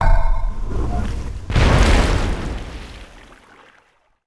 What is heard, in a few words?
Build Destroyer; Civ4 warlords